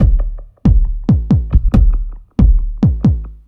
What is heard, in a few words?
138 Dance Kick; Dance DJ; Drums; Pro Tools Essential Loops; Digidesign